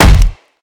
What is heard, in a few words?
impact crunch; Smite; Cabrakan